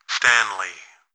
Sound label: Button; Stanley Button